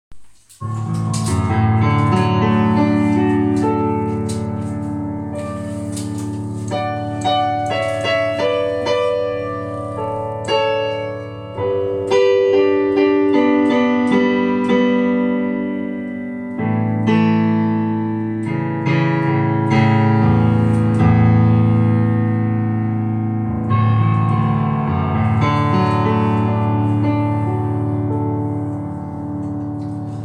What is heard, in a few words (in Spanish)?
saxo piano